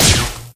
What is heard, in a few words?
laser sear wall; Weapons; Guns Hits